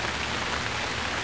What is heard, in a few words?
rain; Ambient; MX vs ATV Unleashed; XBOX